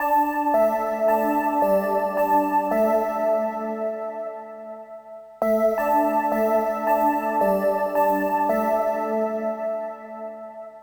Arp synth; Vostok Inc.

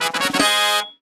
Jingle Get

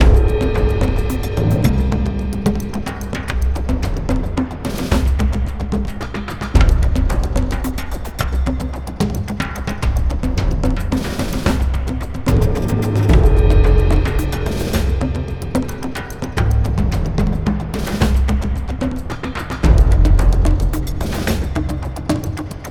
Idle LOOP; BrokenGarden

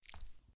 Sticky

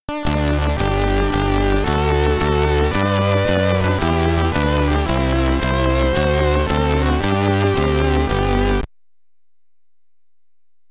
France La Marseillaise (SID Stereo); Auf Wiedersehen Monty; Commodore 64

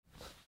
Stand Up

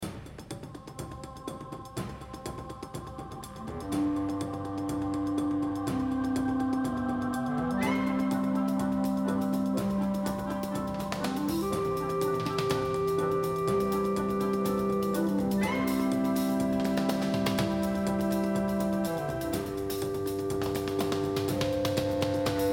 Music; Tower Climb

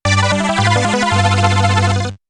Miss; Touch the Mappy; Android

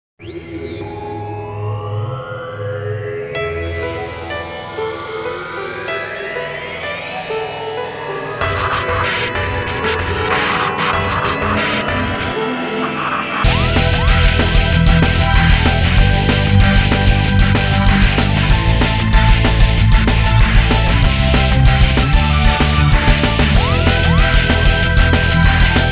Beat Short Ver. Instrument

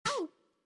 Pain hit girl

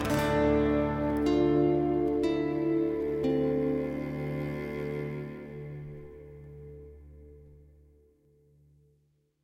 Amb Stinger Sword Found; assassin s creed chronicles india; Katasraj

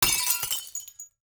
glass break; teardown; ps5 windows xbox series xs

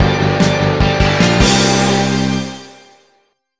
Race Start; Cars Mater-National Championship

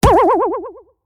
Skill Hit Melee Strike; Angry Birds Epic

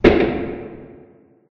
Kick; Five Nights at Ronald's

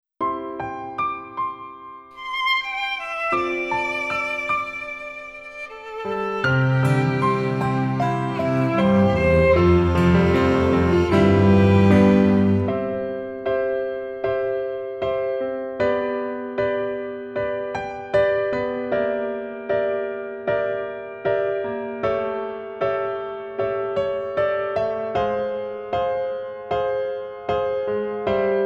Off Vocal; Fairchild GM Original Soundtrack

